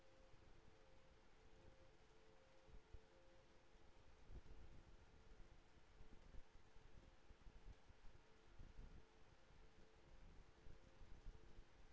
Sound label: HYDROPHONE 16000Hz; JASCO AMAR HYDROPHONE 740